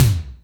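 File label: Wet Hex, Alesis D4 drum machine tom; 300 Drum Machines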